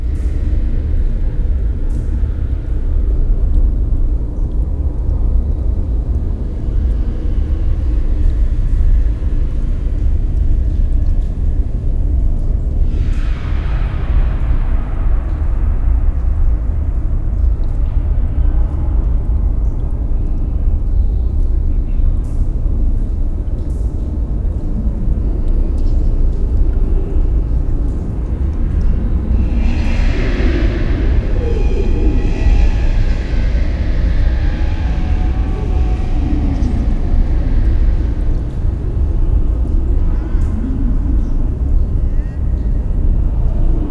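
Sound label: Dark Room ambience; Forget Me Not Annie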